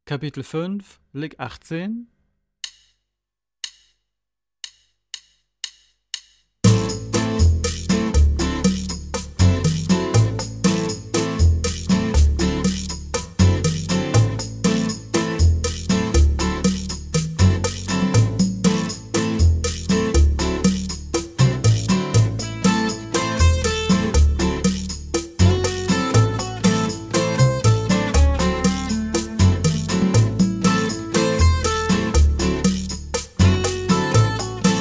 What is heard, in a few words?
Latin Style Guitar